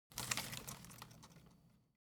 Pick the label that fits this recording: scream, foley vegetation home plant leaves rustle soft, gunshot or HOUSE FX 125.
foley vegetation home plant leaves rustle soft